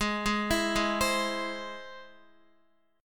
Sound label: Ab Augmented Ab, Guitar, 4F, 4A, 5C, 5G, 5C, 6E; Listen to Ab+ arpeggiated; JGuitar